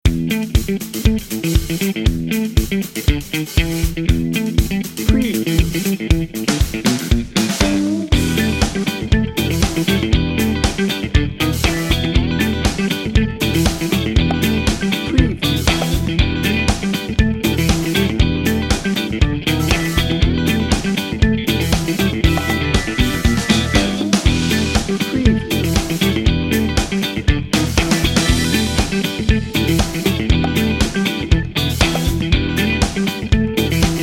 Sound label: funky toes bed no horns